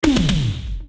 Toms; knight rider

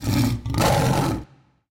lion high; fx; serengetikings

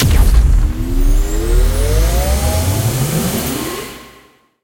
near Win Jackpot; mercy of the gods

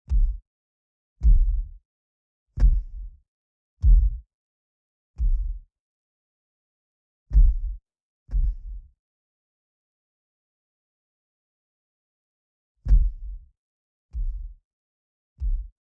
walking Volcano Boss; wild worlds

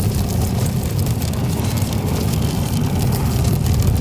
spin loop; gonzosgold

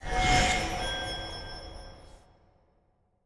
mystery Sym Reset; goldengrimoire

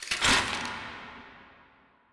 wheel Stop Click; turn your fortune

